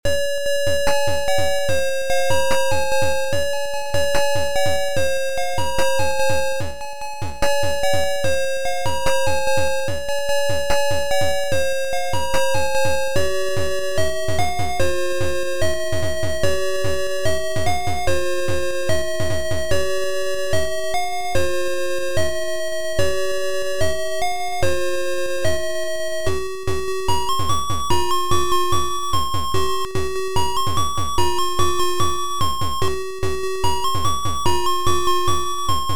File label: BEAT